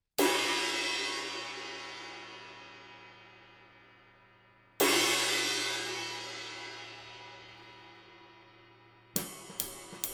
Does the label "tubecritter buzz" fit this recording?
No